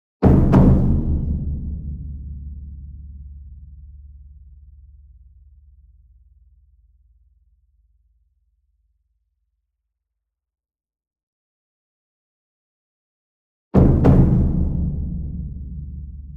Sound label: ENSEMBLE DRUM Bass Drum Concert Large Double Medium; boom library; Cinematic Strikes Construction Kit